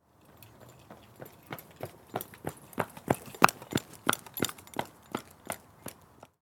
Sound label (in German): laufen mit klappern